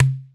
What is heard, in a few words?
IPU SLAP, World Percussion